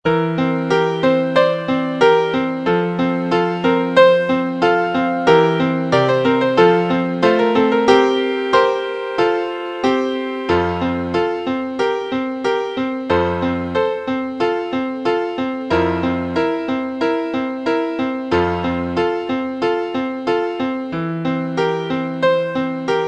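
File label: accompaniments, Trumpet in F; concone; Rudimentary Transposition Studies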